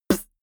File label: beatbox snare punchy short